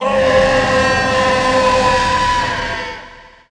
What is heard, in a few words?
death, predator voice